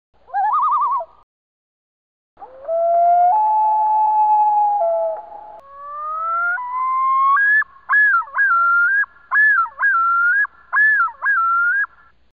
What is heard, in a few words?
loon